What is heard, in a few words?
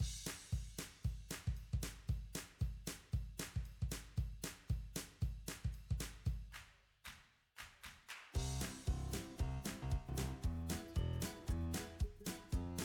music cheerful; bgm